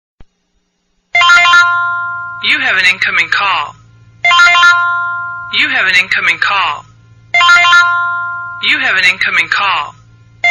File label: You Have a Incoming Call Ringtone